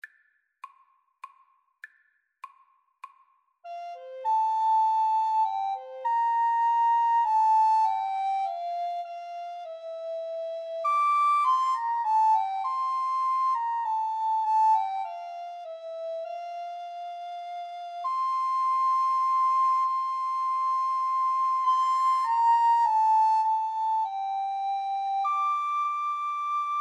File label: dona nobis pacem, Recorder Trio